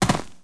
foot

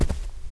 Were Boar walk

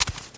Fox run

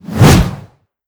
Big Yeti Attack; Seer; GamigoNA

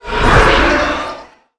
Red Slash; Qickskil

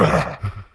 warrior dmg

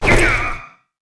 Rock Dmg; Seer; GamigoNA

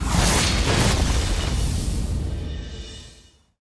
Guild Lose; Seer; GamigoNA

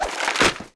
arch mage book die

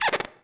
Slime Idle

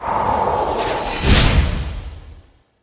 Benediction

